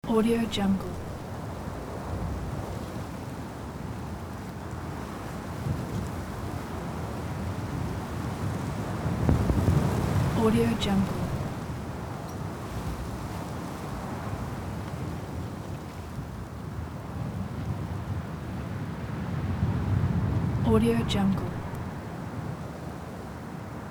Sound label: wind